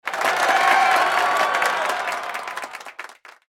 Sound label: applause